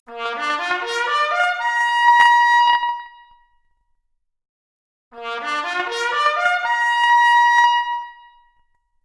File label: Trumpet arp, Stereo output noised; 3bit